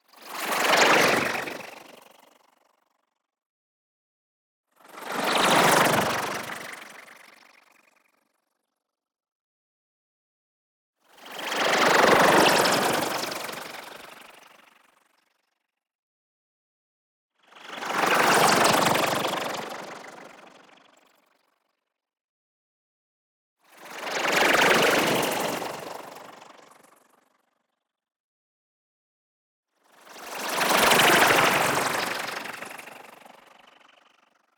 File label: NEON WHOOSH SMALL SLOW; Bright And Bubbly; boom library